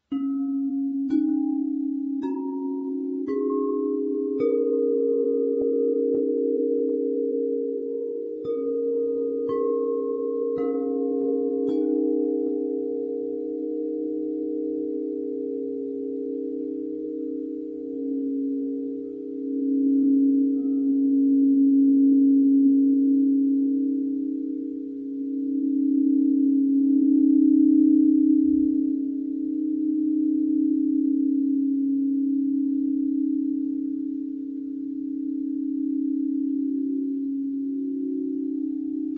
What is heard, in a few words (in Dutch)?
5 elementen parelmoer schalen, witte kristallen klankschalen; vijf elementen set witte kristallen klankschalen 432 hz